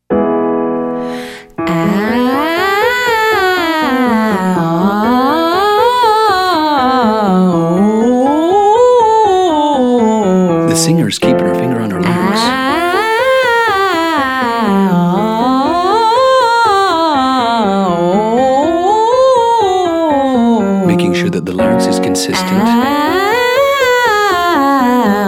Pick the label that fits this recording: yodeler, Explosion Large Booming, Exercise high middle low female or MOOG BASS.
Exercise high middle low female